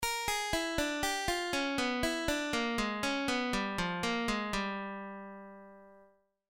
Lick, Blues lick

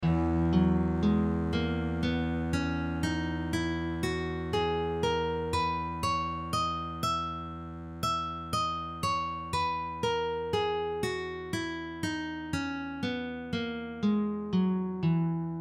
E Lydian; Scales and Arpeggios

